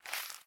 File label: Paper Move; Game rip; MiSide